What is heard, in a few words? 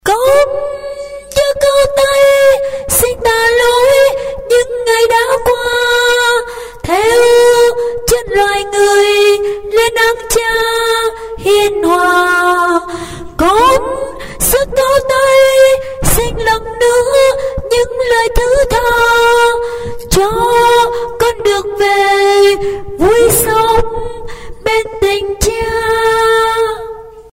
tenor basso